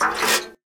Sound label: extra tank; milebymile